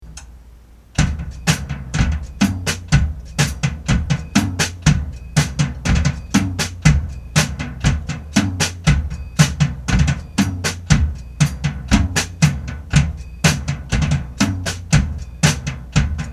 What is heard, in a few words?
Soggy Bass Drum